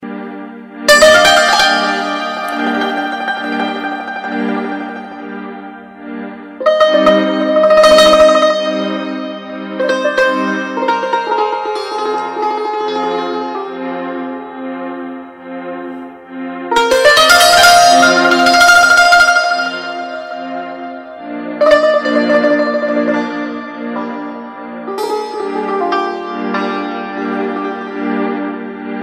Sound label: Cembalo